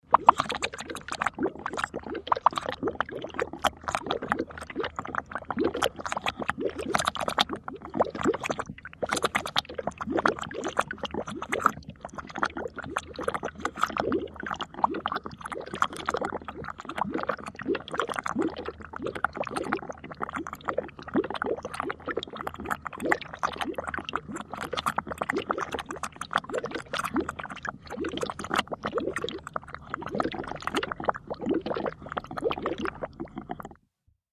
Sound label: Busy Viscous Bubbles